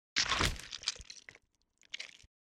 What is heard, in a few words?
bite